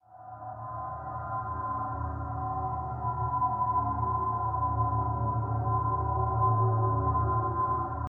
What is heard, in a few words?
Booom; Pokemon Mystery Dungeon Rescue Team DX